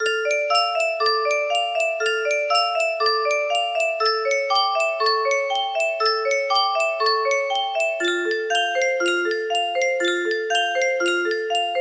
music box 30 note

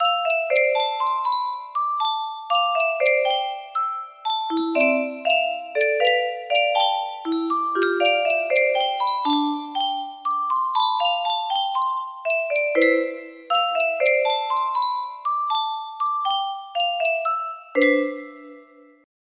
music box intro; dnd

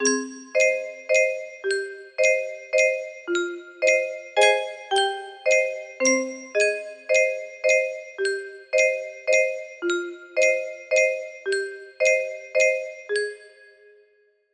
music box version

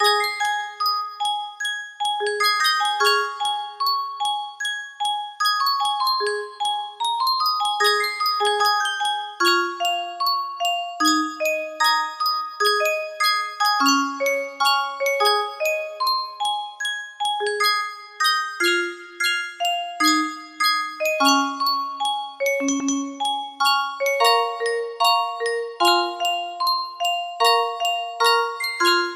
piano, music box

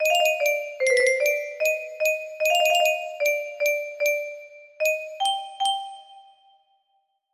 music box

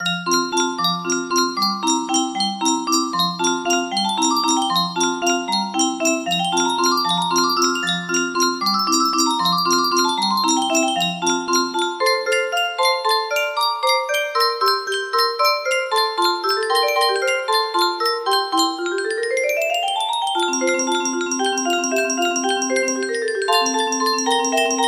waltz in F major

